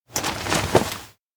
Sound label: land; aino; anemoi